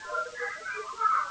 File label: kid